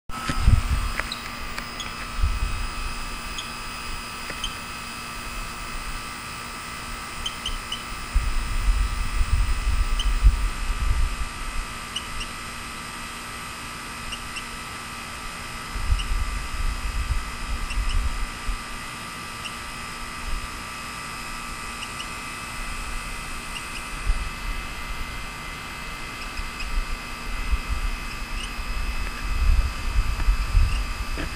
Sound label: Eleutherodactylus cystignathoides